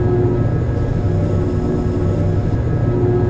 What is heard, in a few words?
plat move; ambience; xash3d fwgs; urbicide